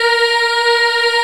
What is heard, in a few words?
A#3 child eh, Choir; USB Soundscan vol.28